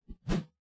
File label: melee attack swoosh heavy, weapon swooshes; psfx